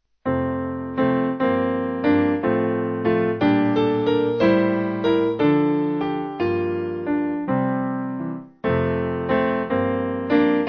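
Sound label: Piano, church music backing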